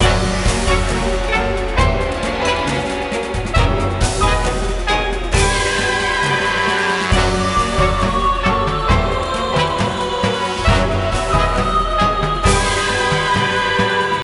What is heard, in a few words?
Pirate Battle; Lego Battles 2009 NDS